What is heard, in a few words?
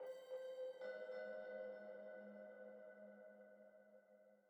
Env Field Night Cave; the legend of zelda tears of the kingdom